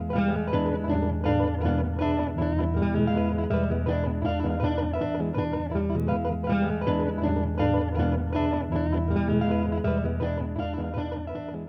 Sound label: angst loop